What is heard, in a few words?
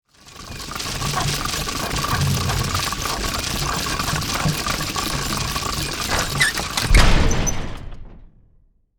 Medieval castle drawbridge lowering